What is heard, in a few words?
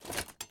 armor